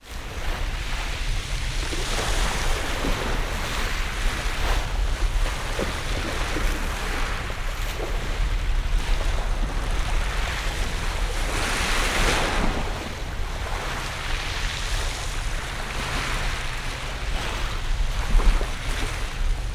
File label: water ocean waves med against habour port wall beach port hum in bg